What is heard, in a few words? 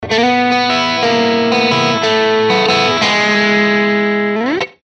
Org M Arp